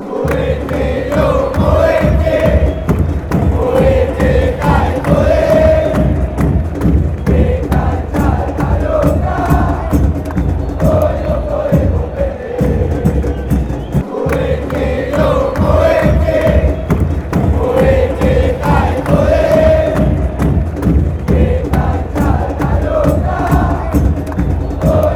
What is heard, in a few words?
chant, argentina; huracan